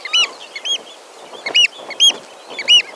oysterc

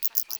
Myotis nattereri, batcalls, europe

